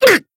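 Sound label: Voice damaged, Japanese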